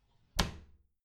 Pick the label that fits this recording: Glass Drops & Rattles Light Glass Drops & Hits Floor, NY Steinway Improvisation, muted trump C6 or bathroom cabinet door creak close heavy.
bathroom cabinet door creak close heavy